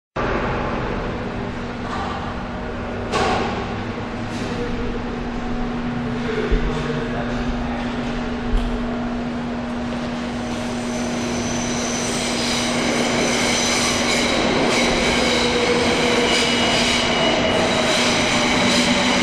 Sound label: ambient subway station; Marc Ecko's Getting Up: Contents Under Pressure; XBOX